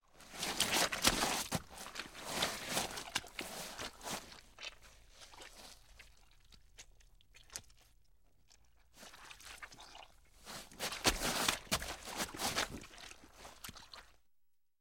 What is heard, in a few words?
Body Falls Getup Mud